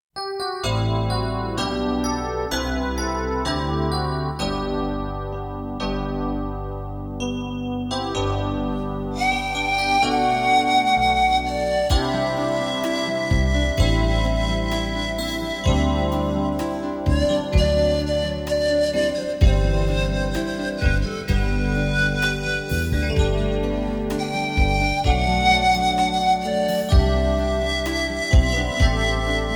Flute

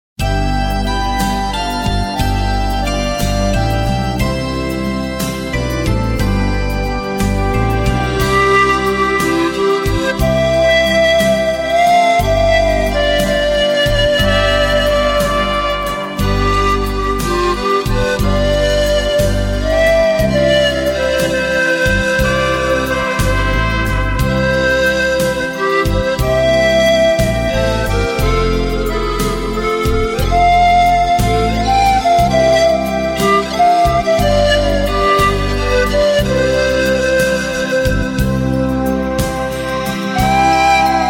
TRUMPET

